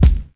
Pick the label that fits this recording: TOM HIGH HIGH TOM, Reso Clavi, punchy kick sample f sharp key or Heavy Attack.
punchy kick sample f sharp key